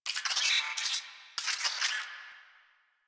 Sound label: Space Drone Enemy Spotted; Warframe; Corpus Space Drone